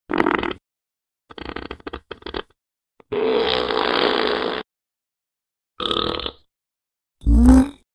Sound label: toilet